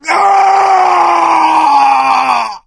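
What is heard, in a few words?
death scream, male; Left 4 Dead 2; coach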